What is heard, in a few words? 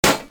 clipout, weapons; airburster